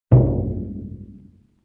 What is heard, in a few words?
LoFi Bass Drum Hit; Vintage Cartoon; Drums & Percussion